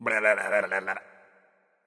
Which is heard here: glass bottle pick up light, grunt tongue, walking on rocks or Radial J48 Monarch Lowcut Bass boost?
grunt tongue